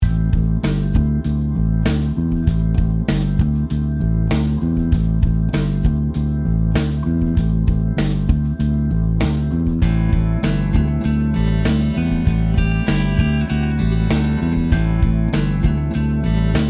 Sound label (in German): Rock Bass Pentatonik; rockige Bass Riffs